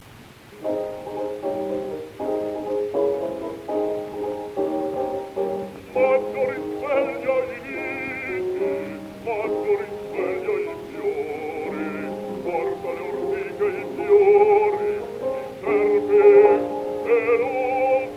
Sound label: Gramophone